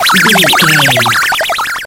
retro magic spell cast

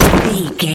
rock impact small hit

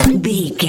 weapon fun pea shooter

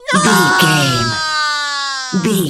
voice male death high

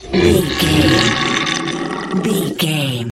troll monster death slow

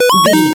retro synth beeps

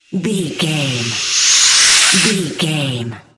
dark wind suck conjure

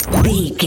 vanish spell flash potion

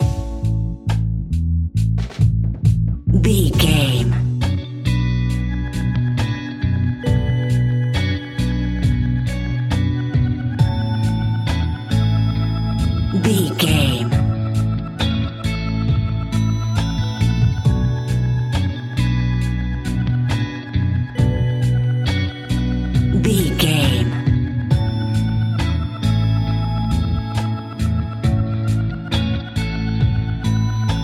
A relaxed and chilling piece of music

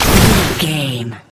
water blast projectile spell

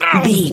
voice male hurt pain

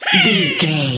goblin fairy attack low

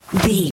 foley cloth light fast movement

